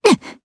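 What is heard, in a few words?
Vox Landing, Japanese; voices; heroes; Ripine; kingsraid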